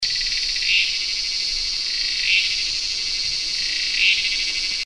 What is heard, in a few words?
sibylla cicada